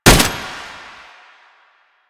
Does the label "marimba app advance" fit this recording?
No